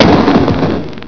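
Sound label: THUNDER; HUBIE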